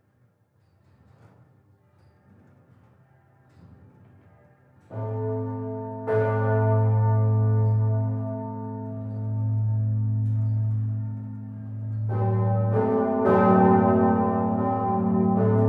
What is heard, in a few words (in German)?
nordturmglocken, Glockengeläut der Stadtpfarrkirche; Stadtpfarrkirche St. Peter und Paul; Lindenberg